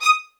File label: D# 2, soft violins; Q Up Arts; Denny Jaeger Master Violin Library